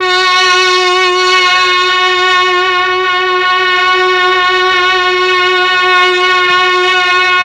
MEL STR, Legendary M400; ILIO; Propeller Island

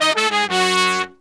Horns, 120BPM; 90 s Sample CDs; Zero-G; Phantom Horns

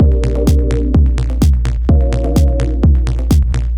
127bpm, House Drumloops; Zero-G House Fabrik Drumloops; ZG Drumloops

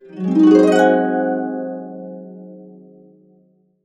AB, Harps; USB Soundscan vol.62; AKAI

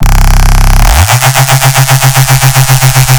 reese, bass

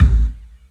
LEDRMK, 3D Drums, LESLIE KICK

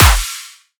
drum kick thicc clappp; Virtual Riot; Drum Hits

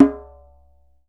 DJEMBE, African Drum

